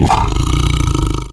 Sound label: BLOODCAT GROWL